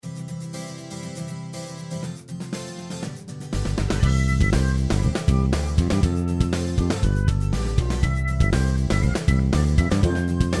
happy polka smile

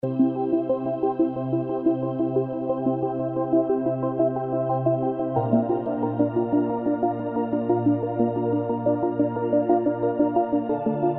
little ambient

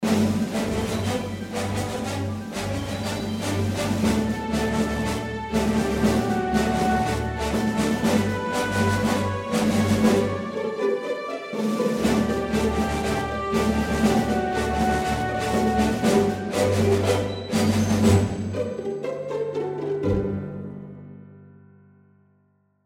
victory and joyful

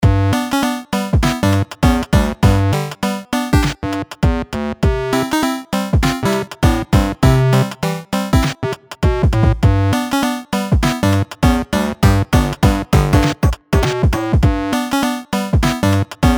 8bit platformer

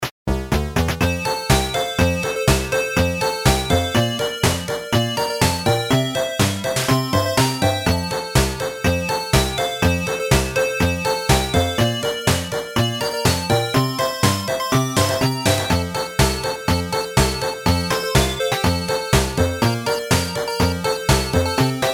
jingle bells 8bit

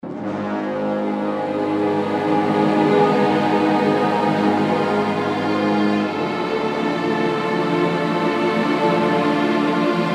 dark movement

